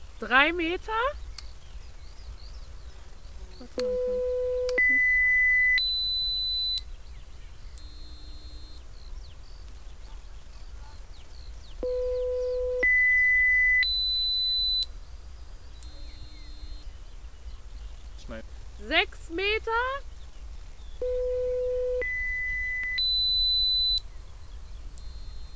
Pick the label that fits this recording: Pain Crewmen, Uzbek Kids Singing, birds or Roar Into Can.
birds